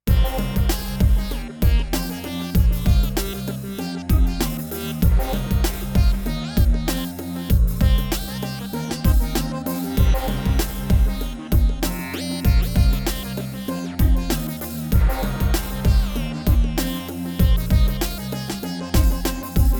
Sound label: ECC83S Tubes Half